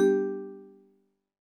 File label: notify normal; dunst